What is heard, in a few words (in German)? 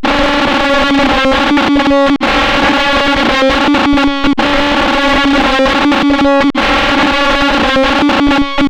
SOMA ROAT Elefantenchor